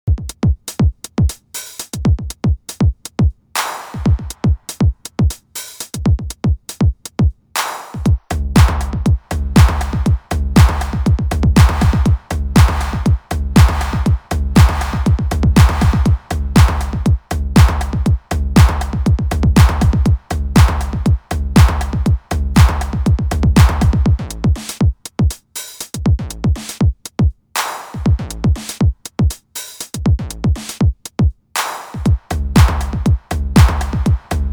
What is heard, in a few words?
TR909 Kit Housy Hippster Style